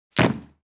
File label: body falls onto woo; fights bodyfalls; Asian Show Sound Effects